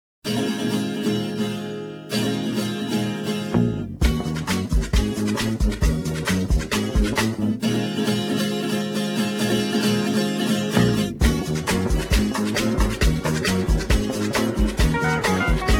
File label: venus instrumental